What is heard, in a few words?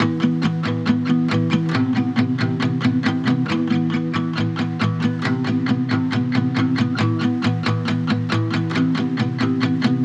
137 BPM E Min, Guitar; Snakes; Cymatics; Miami Guitar Pack - Pt 2